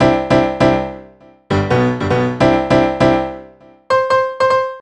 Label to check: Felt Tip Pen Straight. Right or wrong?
Wrong